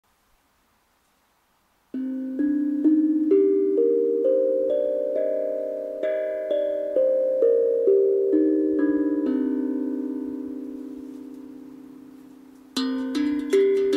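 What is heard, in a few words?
C Pygmy scale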